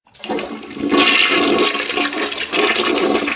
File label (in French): Chasse d'eau, Notification Alerte Bruitage téléphone portable; Chasse d'eau 02